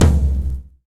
mid tom drum one shot c key, Royality free tom sound tuned to the C note.